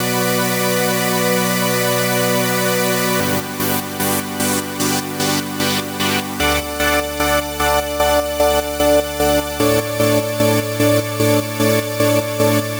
Synth Loop BPM 150 B Min; Gully Loops; Jannat; UDD Gaye Sample Pack

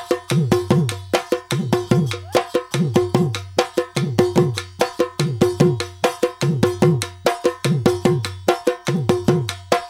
Processed Dhool Loop; Gully Loops; waves of bihu vol 1

